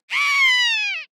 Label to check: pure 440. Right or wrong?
Wrong